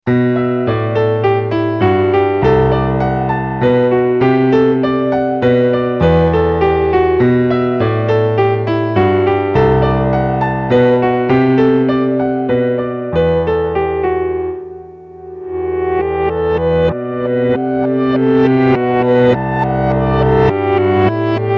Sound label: arpeggio reverse